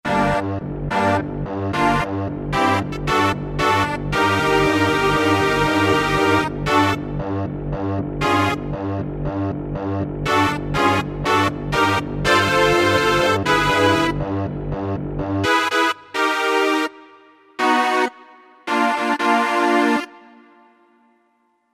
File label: pad Grunge; Factory Presets